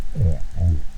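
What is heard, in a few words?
Boom, fish sounds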